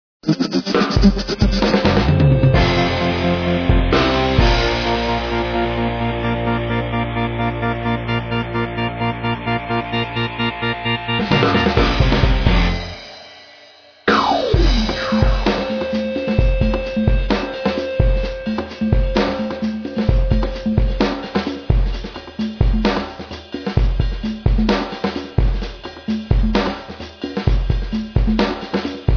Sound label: classic rock positionner